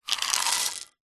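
household vitamin tablets in plastic pot tip out onto solid surface; zapsplat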